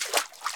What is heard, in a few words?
spring step; Original TK Sounds